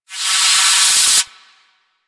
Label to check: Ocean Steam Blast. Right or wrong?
Right